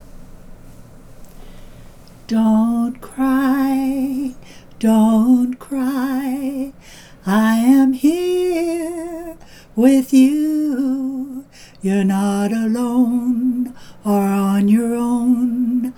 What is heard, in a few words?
childs lullaby